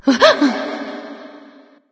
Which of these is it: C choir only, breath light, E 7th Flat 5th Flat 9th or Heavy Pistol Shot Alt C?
breath light